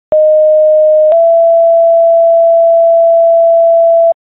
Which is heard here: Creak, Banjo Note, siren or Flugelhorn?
siren